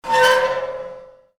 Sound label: metallic scream